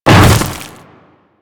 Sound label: Hammer Boss Step Ice, Footsteps; Warframe; Grineer